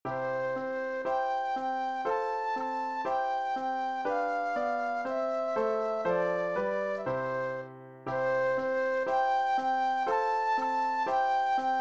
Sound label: Flute Solo; classical